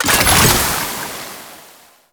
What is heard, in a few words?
Alchemy Grenade Deposit Toxin; Entrati